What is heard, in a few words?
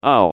pain sharp; John Madden